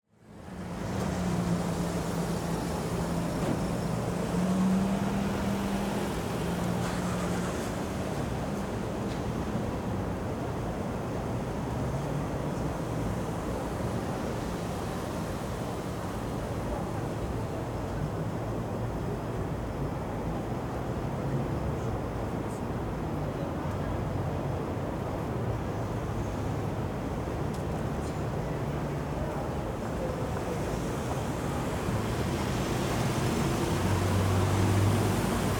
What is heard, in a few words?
Parking Garage, North Side; Beverly Center; RDGSFX004 - LA Parking Garages